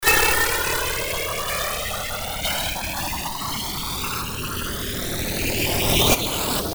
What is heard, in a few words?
metal noise